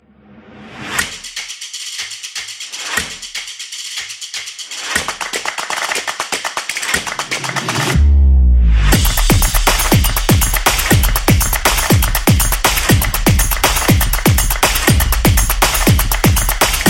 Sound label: Beat Drum